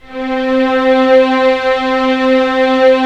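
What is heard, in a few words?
STR String Section; Roland - LA Composer vol. 4; Full Sec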